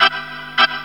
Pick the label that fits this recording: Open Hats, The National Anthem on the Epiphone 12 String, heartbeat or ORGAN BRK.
ORGAN BRK